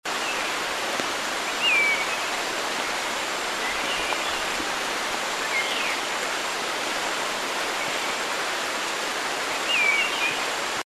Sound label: long billed cuckoo